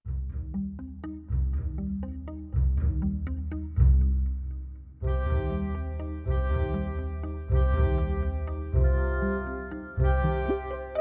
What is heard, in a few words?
Music